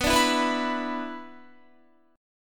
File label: B-Minor Double Flat 5th, Guitar